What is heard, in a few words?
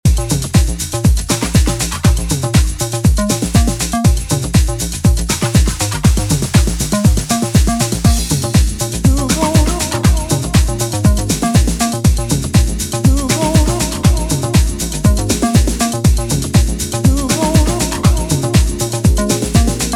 Piano Version